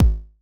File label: One Shot Kick, Deep House; Lover Groove; Ghosthack; Advent Calendar 2019